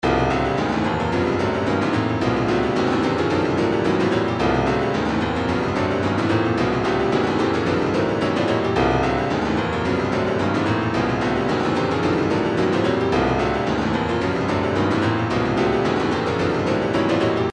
retro funk 110 go go, midi

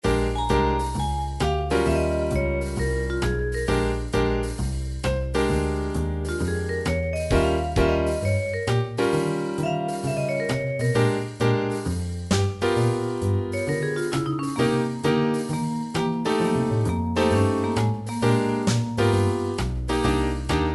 jazz blues

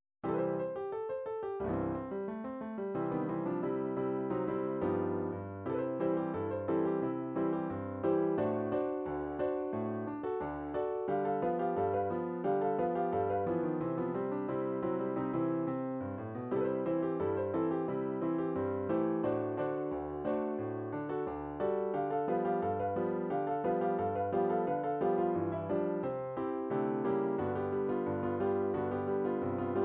piano

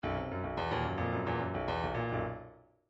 midi grooves funk

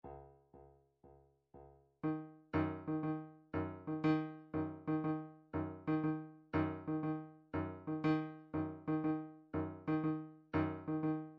drumtrax jazz 120; Drumtrax Library 2

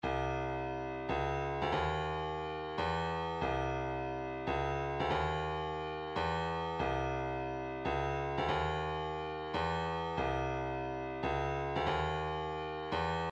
142 kick, midi

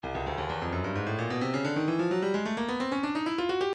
breakbeat midi, 130 bpm; Stylus

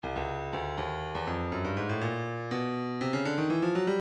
stylus breakbeat midi files 121 bpm; Studio 54 D

